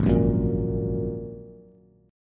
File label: Ab 7th Suspended 2nd down Bass; Ab7sus2; strummed; chordshape; JGuitar